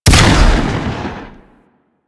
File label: Double Barrel Shotgun Fire; Weapons